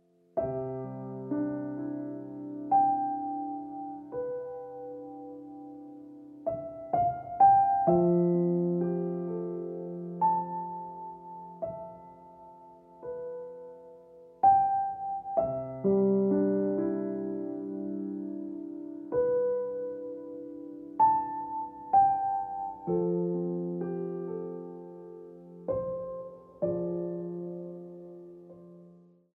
Dancing Trees Ringtone Piano Music

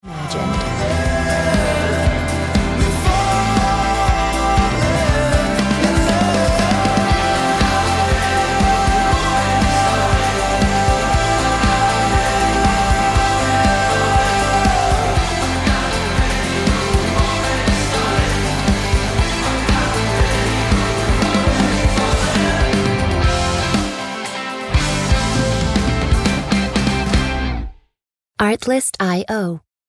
Falling Ringtone

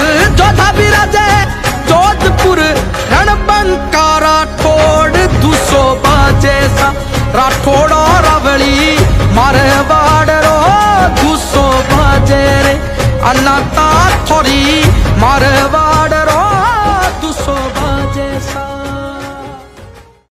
Ringtone

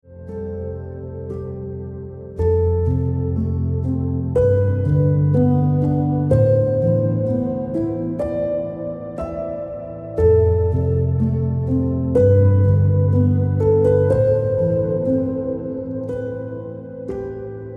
Just You Ringtone Piano Music

